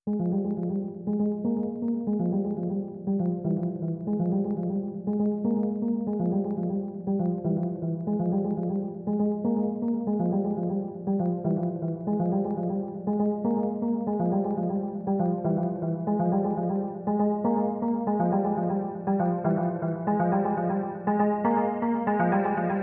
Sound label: Bells